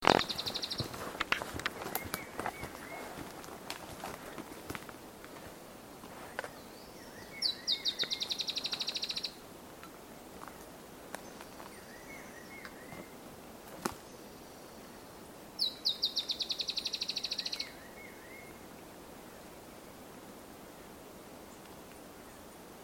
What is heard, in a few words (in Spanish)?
tiluchi, Tiluchi Estriado (Drymophila malura); EcoRegistros